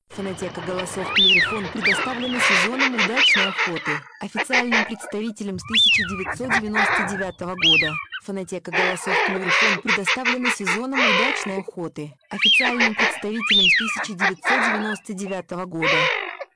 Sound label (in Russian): свиязь; plurifon